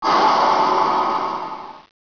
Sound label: lava